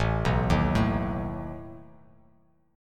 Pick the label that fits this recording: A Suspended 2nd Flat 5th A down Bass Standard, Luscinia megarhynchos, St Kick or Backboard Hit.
A Suspended 2nd Flat 5th A down Bass Standard